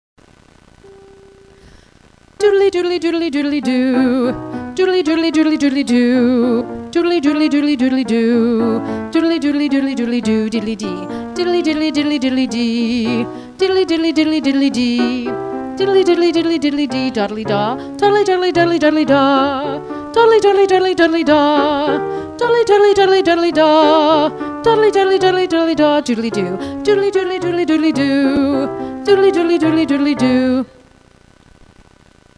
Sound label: Warmup, Vocalization